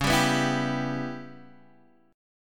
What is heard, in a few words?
Db Minor 7th Flat 5th, Guitar, Open D